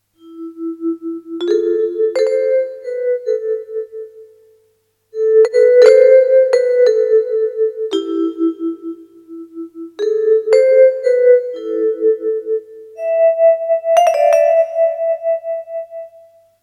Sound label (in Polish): Wsrod nocnej ciszy impro ksylofon